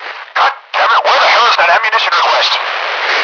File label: ammo